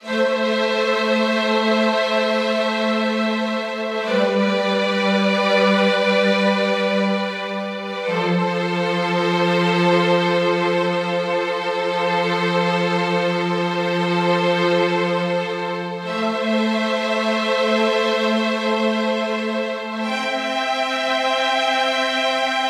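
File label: Violins 1 & 2 & Celli - A minor 120BPM; Construction Kits; STEMS; Ghosthack; SkyRealm; Kit 05 - Heart Of Fire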